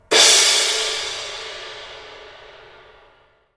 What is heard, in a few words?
19in K Custom Hybrid Trash Smash, cymbal